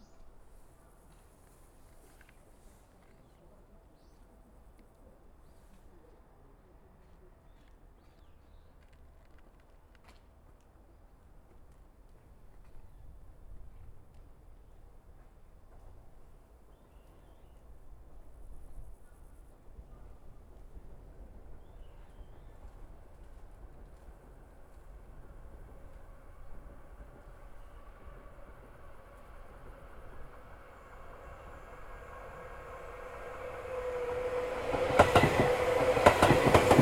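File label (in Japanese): Stereo, 電車通過; RODE NT-SF1をステレオに変換し; ZOOM F6 MultiTrack Field Recorder; サウンドフォージでノーマライズしました。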